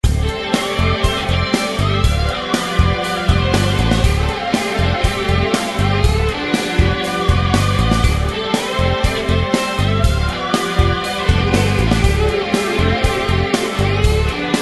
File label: erhu solo